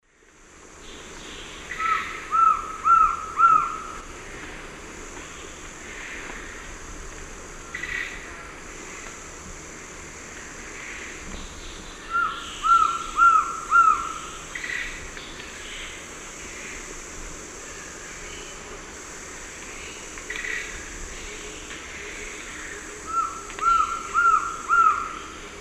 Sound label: Trogon rufus, song; S. America; Eastern Lowlands